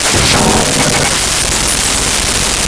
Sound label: starter; Sound Effects Library (28Cds) plus bonuses